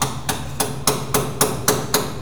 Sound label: Hammering, INDUSTRIAL